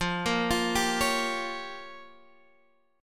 Db 6th Flat 5th

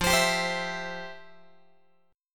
F Major 7th Suspended 2nd, F, down, Guitar

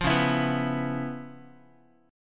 C sharp 7th Sharp 9th Guitar, chord; strummed; C#7#9; JGuitar

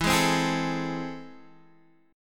A Minor Major 7th, E

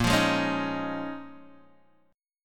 Bb Minor 11th, Guitar, Open D

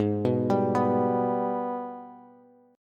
G sharp Minor Major 7th G sharp down Bass; arpeggiated; JGuitar

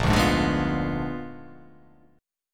A sharp 7th Flat 9th A sharp down

